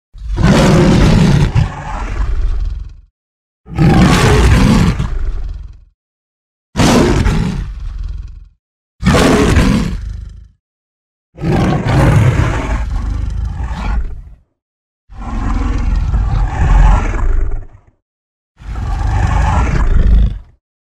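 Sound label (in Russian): химера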